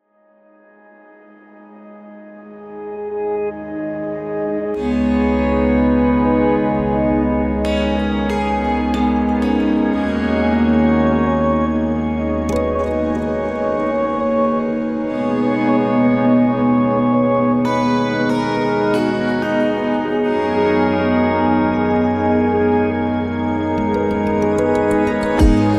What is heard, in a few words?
autoharp